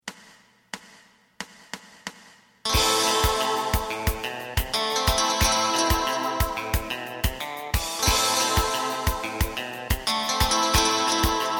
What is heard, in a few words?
bass